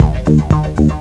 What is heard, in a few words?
bar, Music; Swoop 1.0.0